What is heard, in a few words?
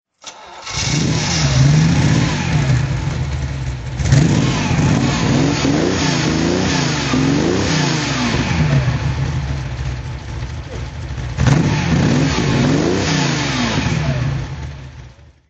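ferrari daytona throttle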